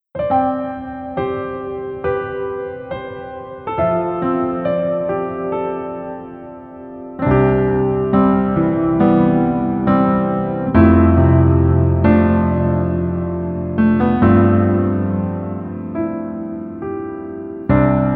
Piano ver 멜로디MR -1키

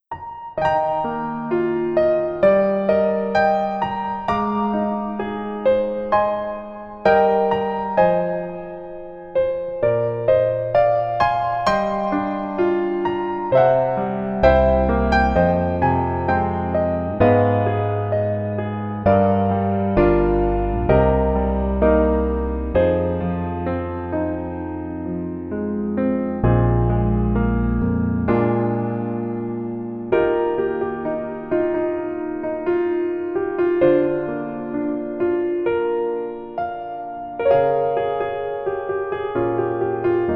Piano ver 멜로디MR

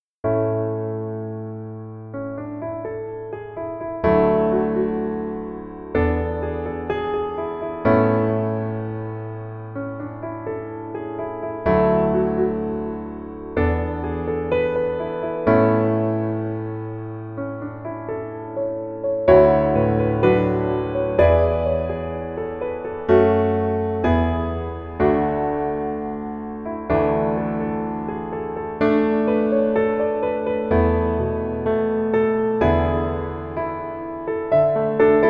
Piano ver 멜로디MR

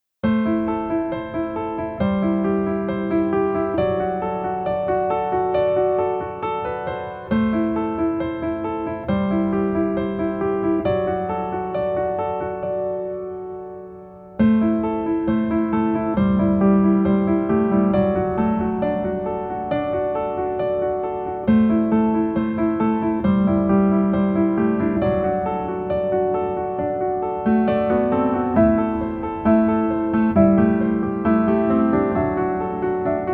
Piano ver 멜로디MR -1키

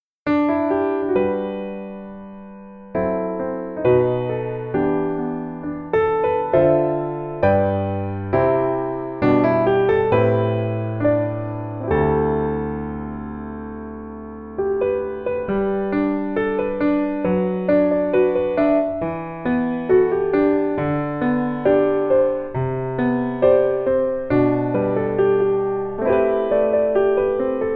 Piano ver, 멜로디MR, 여자키

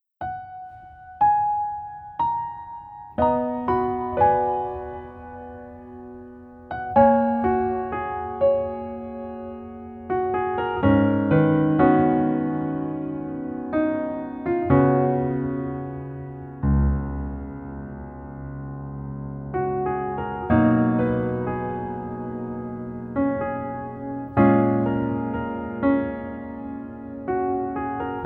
Piano ver 멜로디MR -1키

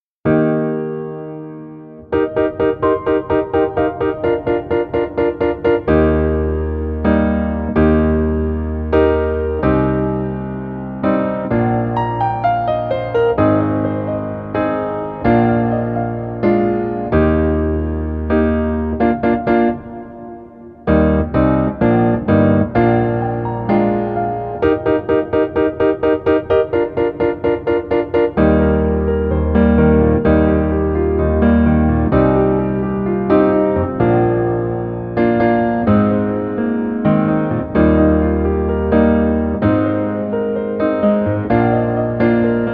Piano ver 멜로디 MR 남자 키

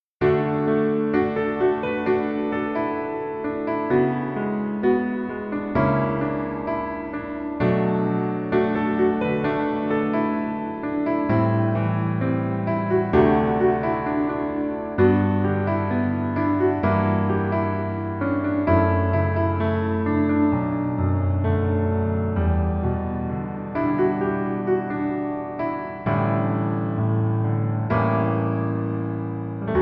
Piano ver 멜로디MR 남자키

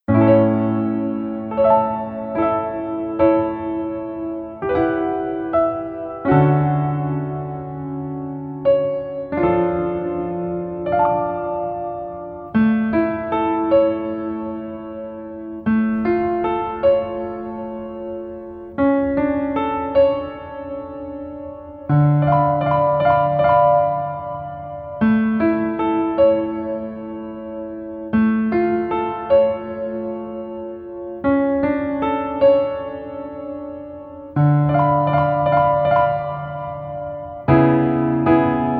Piano ver 남자키

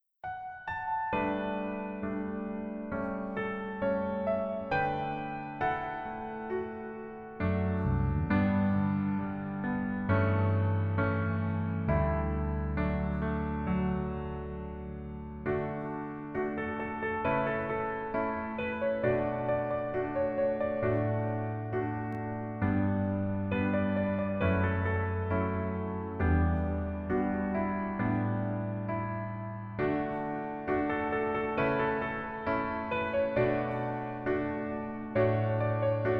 Piano ver 멜로디MR

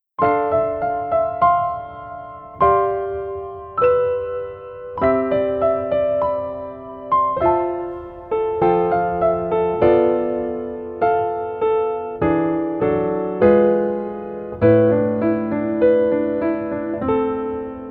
Piano ver 여자 키

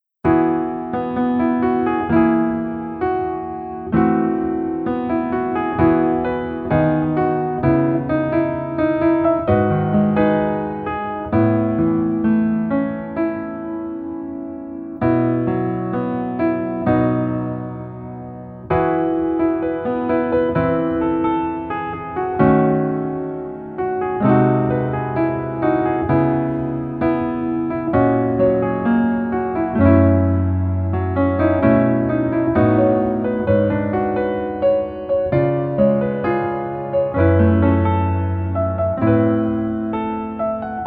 Piano ver 멜로디 MR 여자 키

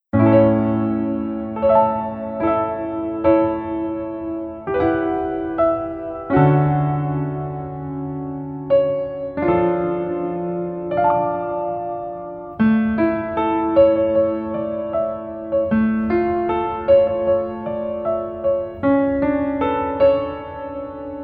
Piano ver 멜로디MR 남자키

